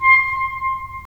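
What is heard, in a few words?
wind clarinet; Roland - LA Composer vol. 3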